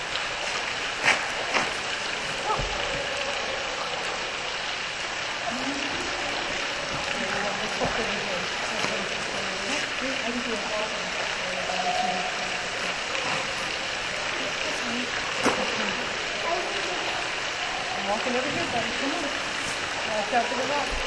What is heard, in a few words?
choir; ash cave 2013